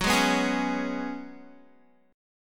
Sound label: F sharp Suspended 2nd Suspended 4th, Guitar; strummed; 13,11,11,13,13,x